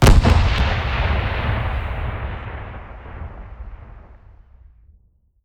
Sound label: Explo; DirectXTK